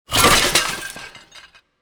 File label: crash; snake game